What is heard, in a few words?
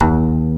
GAYAGUM F 2, KOREAN INST; AKAI S6000 CD-ROM - Volume 1